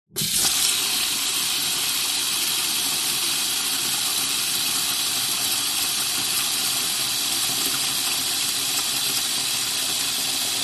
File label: household utility room sink fill plastic bucket with water long sequence